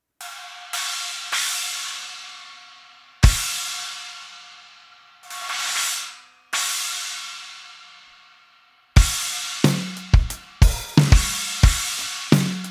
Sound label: 19 AA Holy China, cymbal; sabian; 19 inch